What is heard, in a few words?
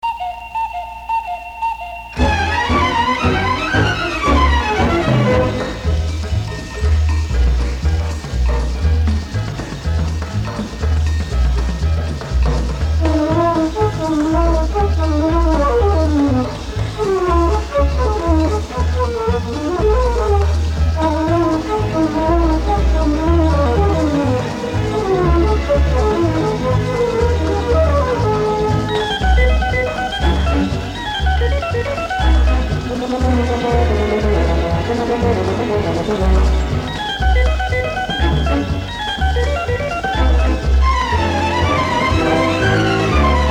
College Band; Bloomfield